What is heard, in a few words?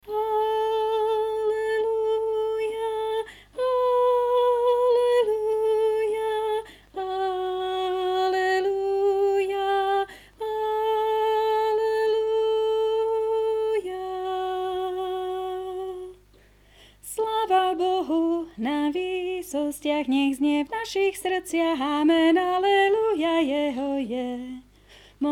Tenor; Vianoce 2019 - Prišiel Ježiš; Farnosť Letanovce